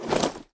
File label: jump; Golden Crayon Sounds